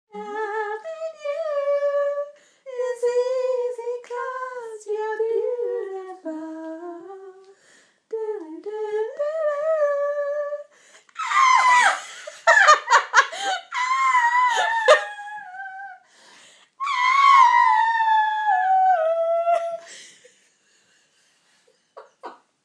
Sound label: more singing ish